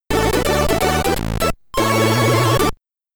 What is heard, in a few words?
Stage Clear (SID Stereo); Blax; Commodore 64